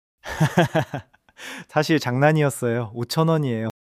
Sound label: laughter